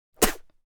Human spitting single spit

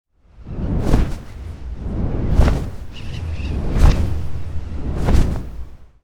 Dragon flight air whoosh loop

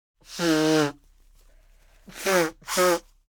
Nose blow cold flu

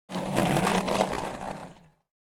Skateboard pass by fast

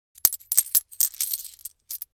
Casino coins being moved around in hand

